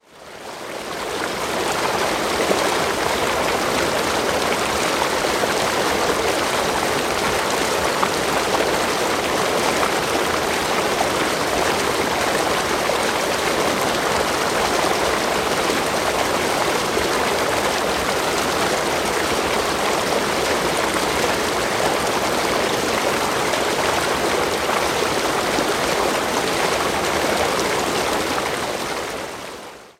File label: waterfall; oreade natura